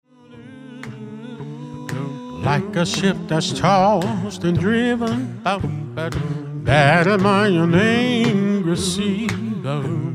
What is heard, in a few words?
ACAPPELLA